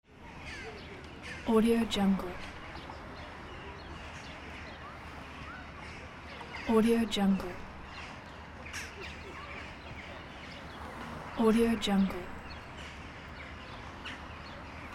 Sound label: crows in city